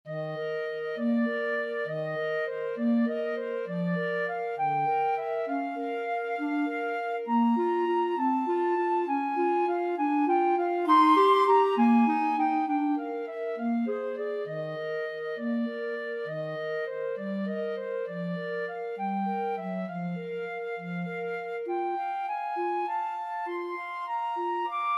flute clarinet duet; tchaikovsky waltz

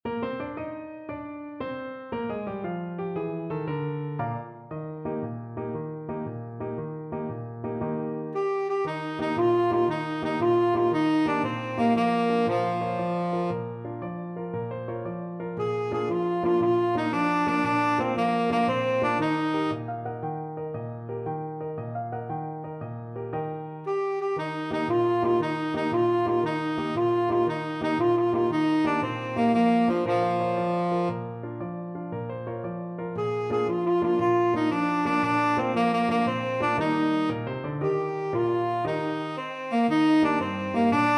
luna mezzo mare, tenor saxophone

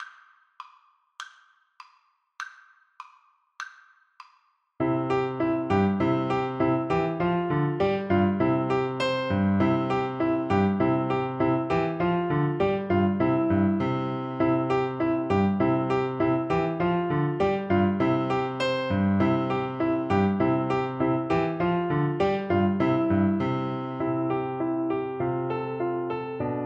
ecossaise, Flute

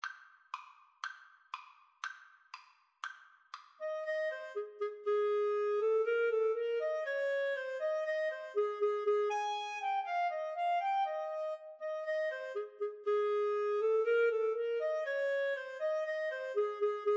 Clarinet Duet

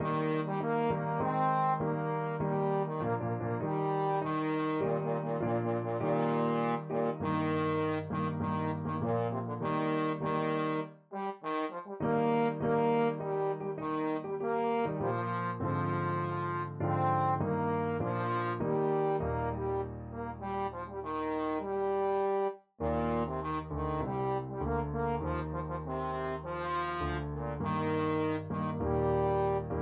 trombone

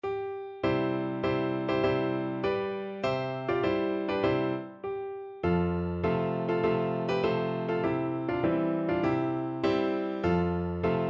march, piano; Hohenfriedberger March

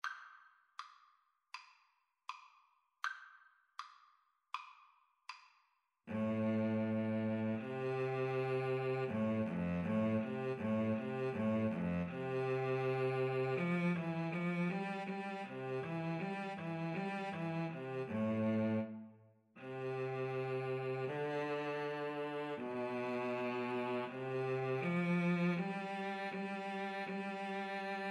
trumpet cello, Trumpet-Cello Duet

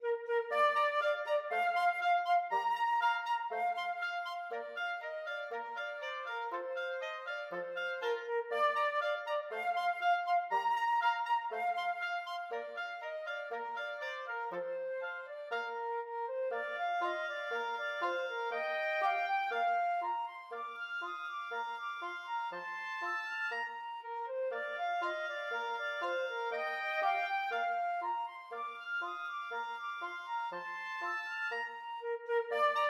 flexible mixed ensemble, 4 players

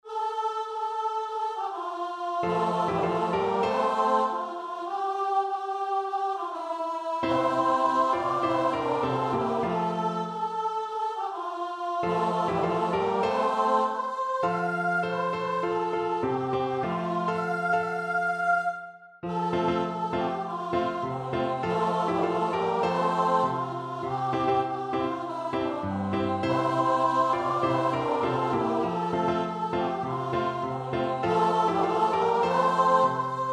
brown girl, choir

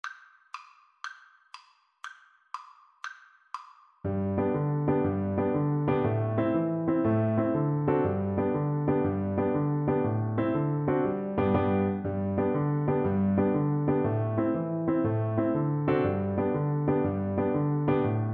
irish jig medley, Flute

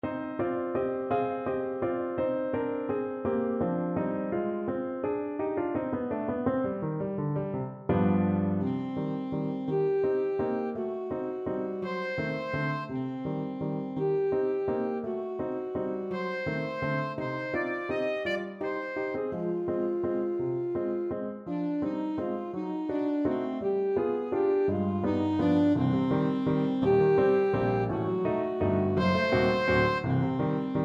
libes shmertsn, saxophone

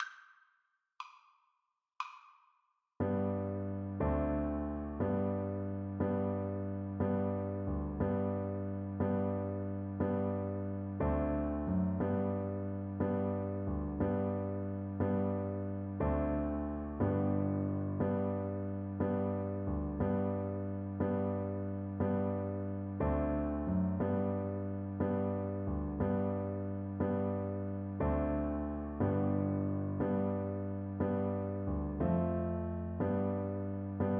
jig, cello

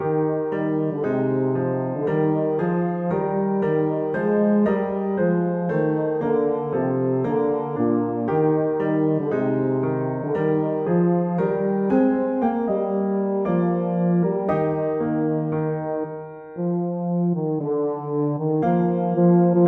men of harlech, baritone horn

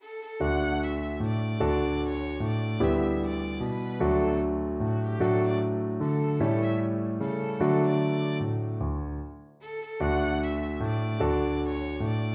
tit willow, violin

